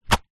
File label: sport swimming cap latex drop ground; zapsplat